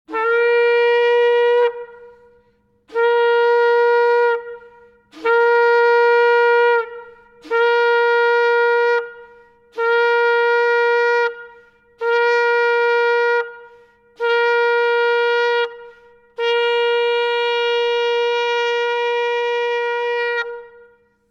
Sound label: Shofar Trumpet Horn Jewish Tribal; The Sounds of the Shofar